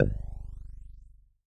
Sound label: Multimedia Internet CD-Rom Flash Click Mechanical